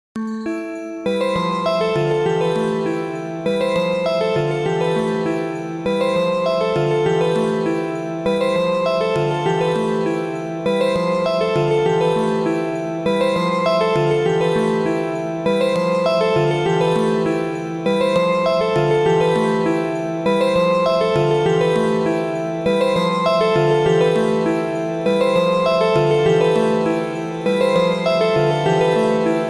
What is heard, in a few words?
Darkness Piano Loop; gekiban